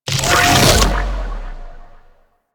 Portal Close; Enemies; Sentient Dolicholyst

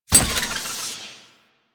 Glyph Prism Hit; Warframe; Duviri; Glyph Puzzle

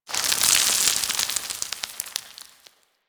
Rock Crumble; Warframe; Quests; TheNewWar; Ambience; Drifter Camp

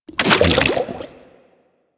Settlement Oil Bubble Burst; Ambience; Warframe; Grineer Settlement

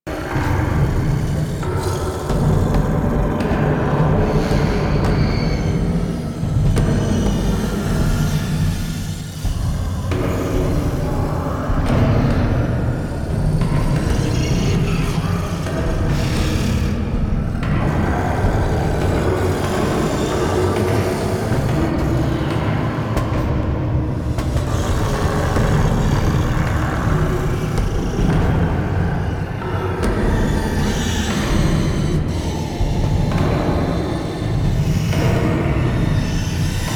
Angry Loop; Warframe; Quests; Entrati Labs

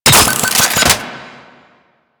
Bomb Extracted; Missions; Raid; Grn Bomb Objective

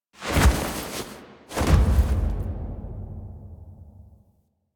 Death Fall; Warframe; Quests; The New War; Drifter; Foley

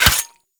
Melee Dagger Impact Flesh; Weapons; Impacts; Daggers

